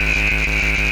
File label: electric alarm loop